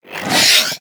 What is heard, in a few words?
Brood Death; Carnassas Brood